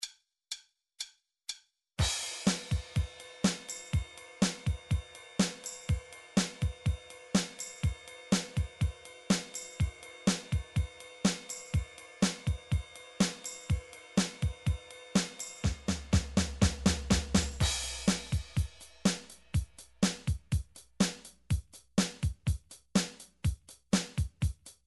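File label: midi drums, southern rock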